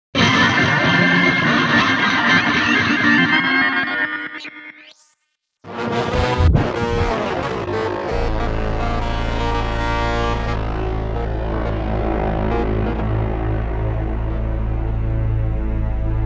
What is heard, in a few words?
Success Jingle; Salmon Run; (Before King)